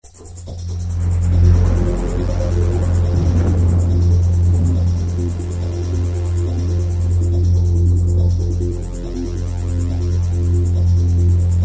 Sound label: Thunder Rough